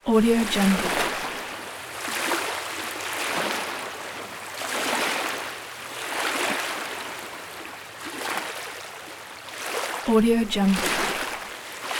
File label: mountain lake waves lapping gently ambience; soundjay